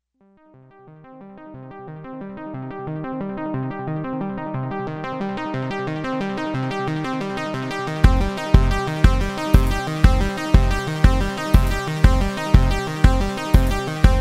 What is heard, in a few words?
Mono Poly Arp Sync; MonoPoly Arp Sync to ProTools; Music created by MonoPoly